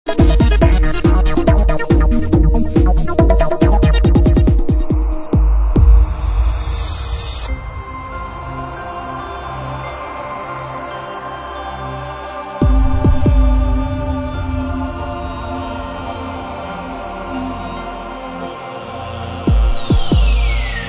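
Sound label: Dance Mix, C64 chiptunes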